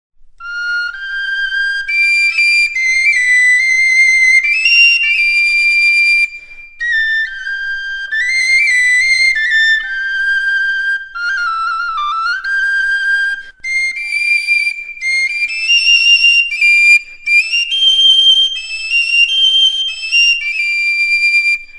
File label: xirula; instrument